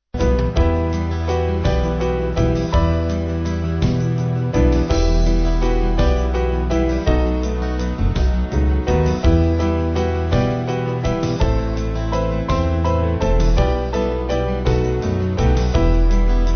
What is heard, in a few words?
Band